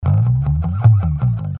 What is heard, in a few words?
Amped Mod Bass A, Heavy Modern Funk & Rock; Loopology